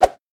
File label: Fishmen Knife